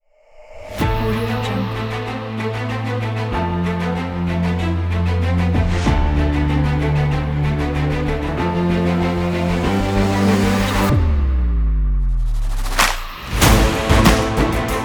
epic sports

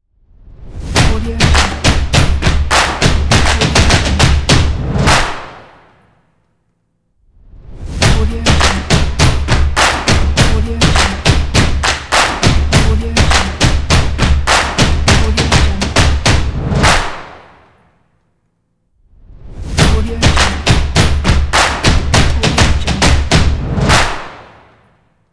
drums and claps logo

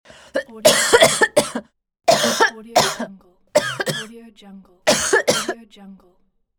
cough

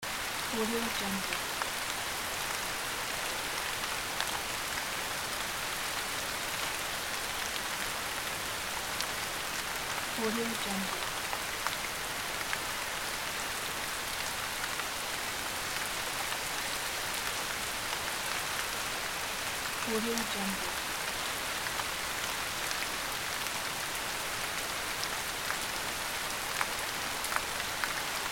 rain in forest

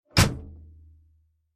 economy car door closes

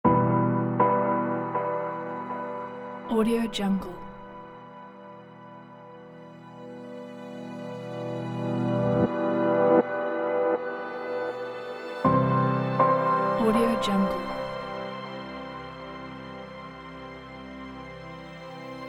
epic rise

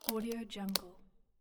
small lockbox clamp; stormwaveaudio

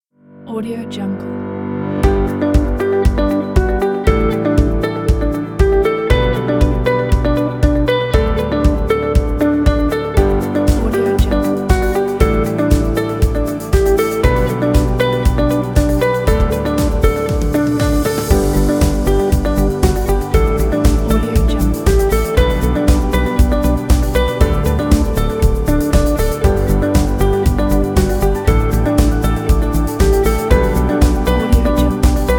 motivational inspiring

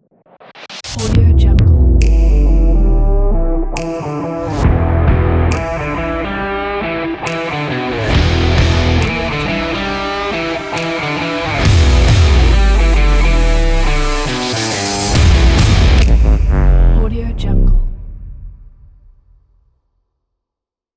action rock logo intro

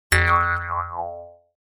cartoon percussive mouth harp

